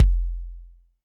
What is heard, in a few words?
Kik Rumble, Drum Machines Alesis D4